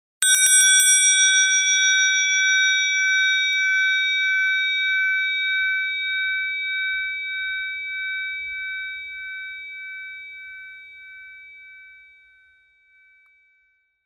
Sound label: bell